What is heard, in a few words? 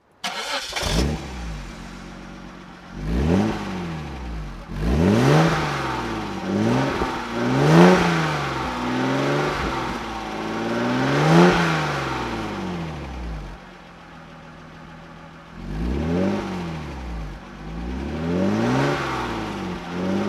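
jaguar XJS coupe throttle, engine sound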